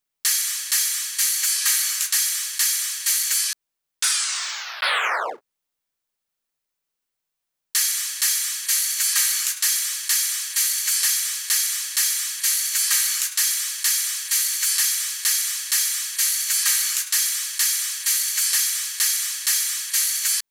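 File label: hi-hat Loop, Drum Loop 128BPM; VEC5 Drumloops; VEC5 Multi Loops 128BPM; Loop Elements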